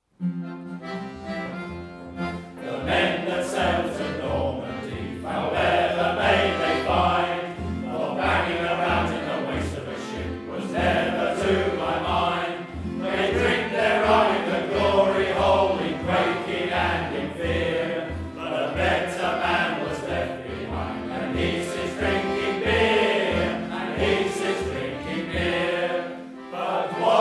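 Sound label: instrumental; Duke William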